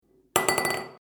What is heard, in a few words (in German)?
Glas faellt um